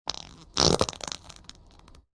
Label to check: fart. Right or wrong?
Right